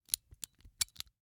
Rachet, vanadium steel, 8in, standard, locking, clicking, mechanical, new, socket release, clear; Eiravaein Works; Vaeyan IV